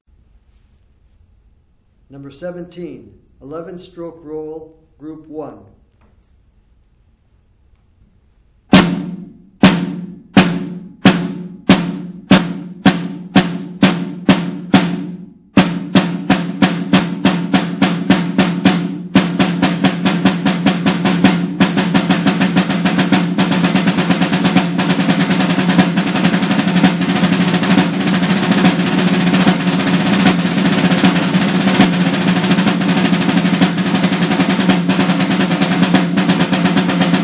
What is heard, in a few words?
snare rudiment